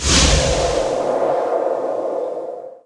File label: frozen shield; zombie elimination